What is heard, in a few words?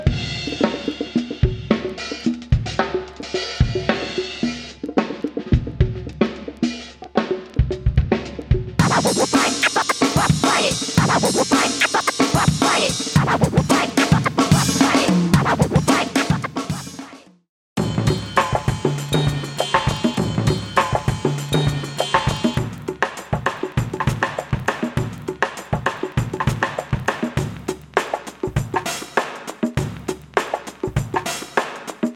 urban grooves